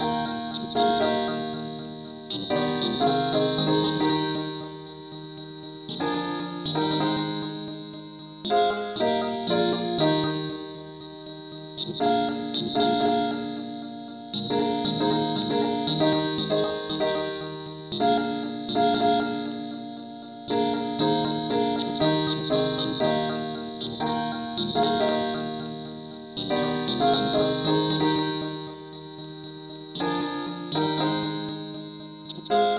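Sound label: parts only key E flat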